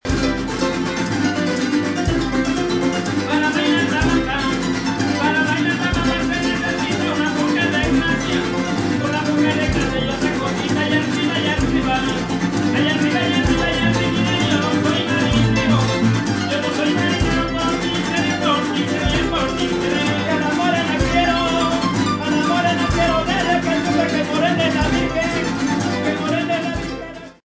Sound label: labamba, jarocho